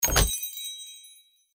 chest open; Fortune God

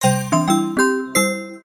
win; Lucky Joker 20

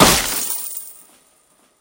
gambler win; Fruit Fiesta; wazdan

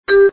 card hold; games; poker; American Poker The Legends II

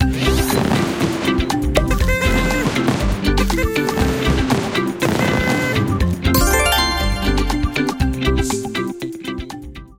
megawin amb sfx; Hot Party Deluxe; Wazdan

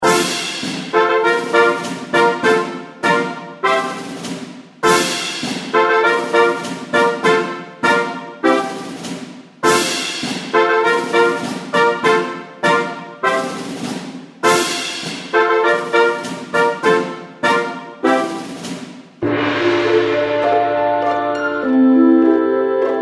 reelspin fg; The Nut Cracker

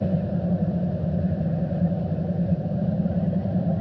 spin fs; In The Forest; Wazdan

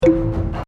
Spin; Fruit King; CQ9

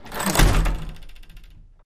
lap info hide; Racetrack Riches; megaboard

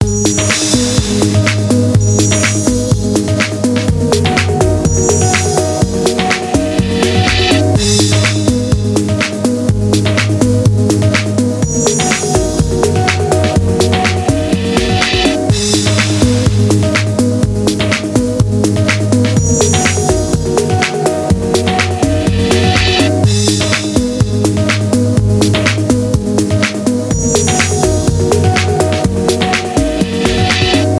free spin melody; Fire And Ice

